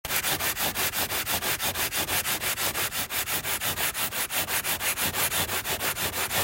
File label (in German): geraeusch